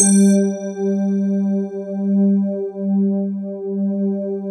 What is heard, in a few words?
crystal pad; Sound Gallery - Charged